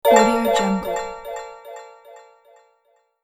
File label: sparkling win magic